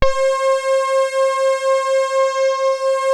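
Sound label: MOOG MODULAR C4; Hollywood Edge - Giorgio Moroder Rare Synthesizer Collection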